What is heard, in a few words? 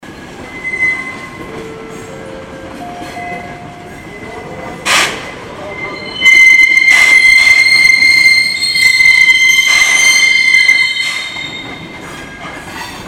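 sound of railway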